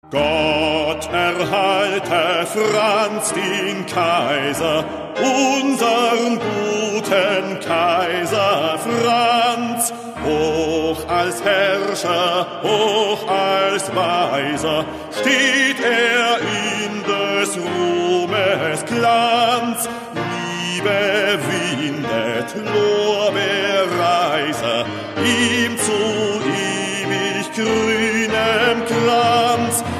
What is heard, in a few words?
Austrian Anthem 1914